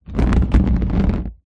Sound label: plastic tub box large drag slide pebbled concrete ground; foley